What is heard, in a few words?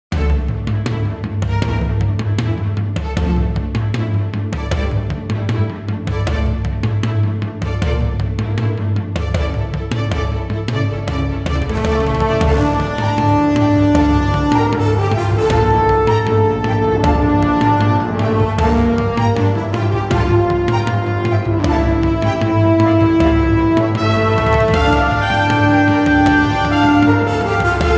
Power Brass